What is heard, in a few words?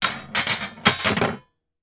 imact metal steel strips drop on wooden floor